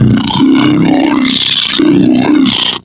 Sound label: sleep idle